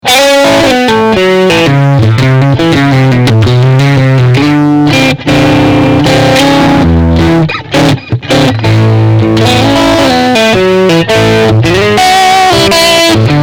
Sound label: Crunch Riff